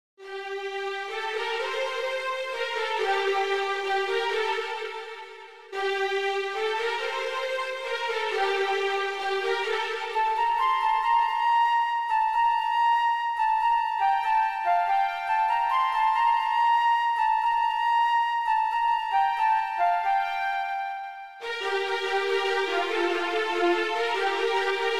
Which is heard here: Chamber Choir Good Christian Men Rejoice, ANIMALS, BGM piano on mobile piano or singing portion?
BGM piano on mobile piano